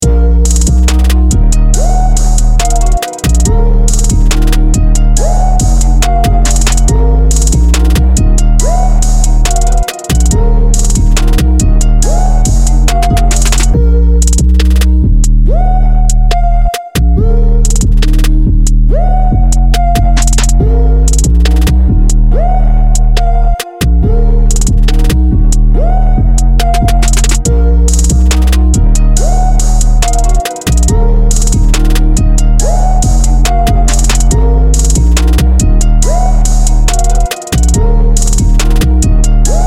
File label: Trap